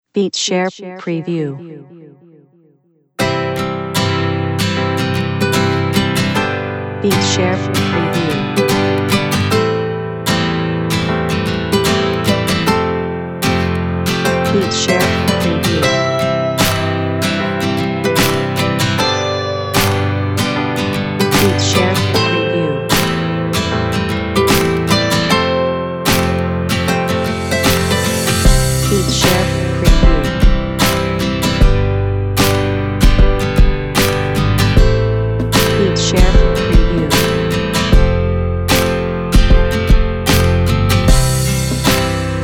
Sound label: pop bpm76 sample